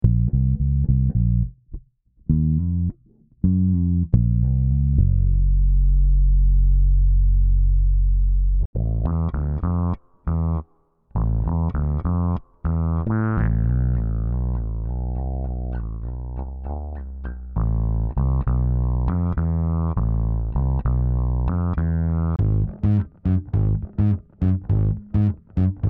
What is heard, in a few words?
bass, hip pop bass loops